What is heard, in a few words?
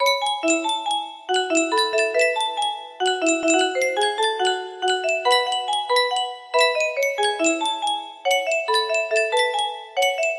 music box, music box melody